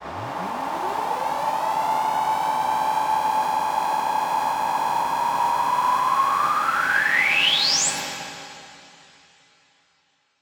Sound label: Force Riser